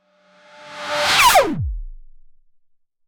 a sharp reversed 808 snar; synthesis

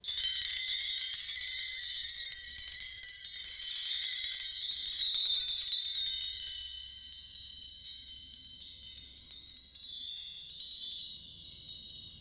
a whimsical chime of tink, bells

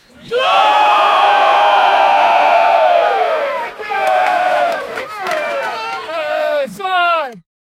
crowd yelling crucify him

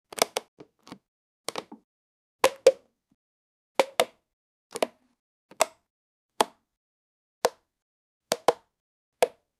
a puzzle piece made of

